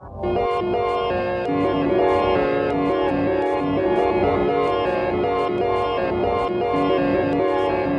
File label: Clockwork Machines - Ambient